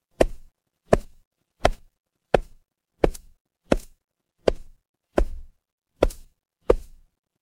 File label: footsteps concrete